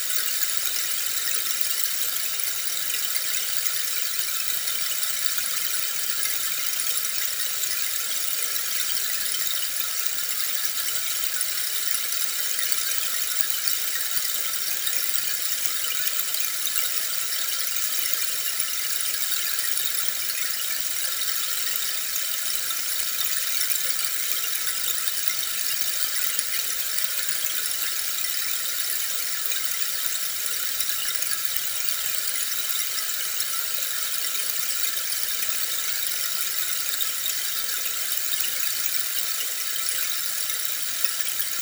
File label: 120 cm sloup, harmonizing rain pillar 120 cm; 60 minutes dark